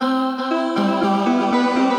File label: Hocketing Resonance, Transformed Voices; Voice Box; Chromatic Voices